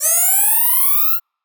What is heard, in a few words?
scifi weapon power up high